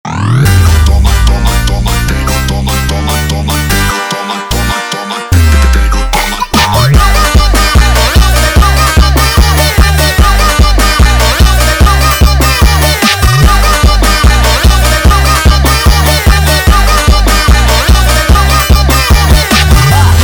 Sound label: Funk sped Up, funk